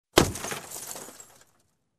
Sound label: Glass shattering deep hit